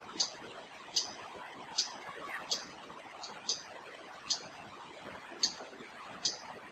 Spectacled Spiderhunter calls background noise removed; Amar